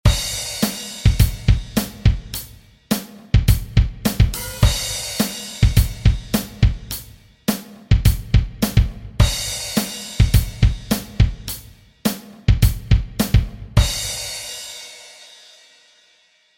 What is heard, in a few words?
drum grooves